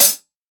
hat one shot e key, high-hat sample tuned to the E note. Loudest frequency: 9287Hz